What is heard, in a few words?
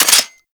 Heavy Shotgun Alt Mech A; Warframe; Tenno